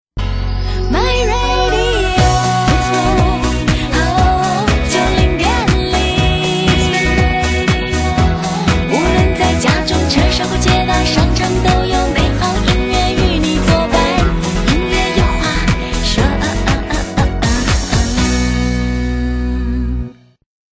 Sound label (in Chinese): 台歌之舞曲版; My Radio